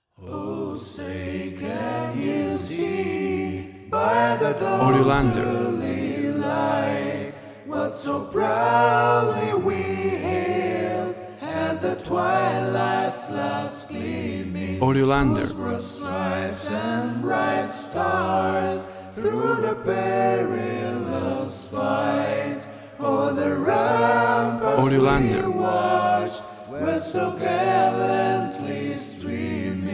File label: USA National Anthem Vocal Arrangement